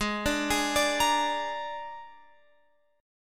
Ab Suspended 2nd Flat 5th chord, Guitar, Open F tuning; Absus2b5; arpeggiated; chordshape